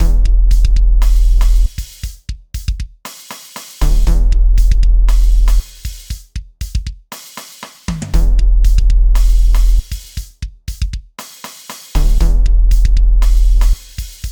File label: upgrades menu